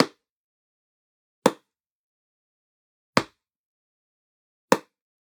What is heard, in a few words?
Generic Click Plastic Medium; boom library; The Interface